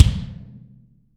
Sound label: BD, Real Mega Drums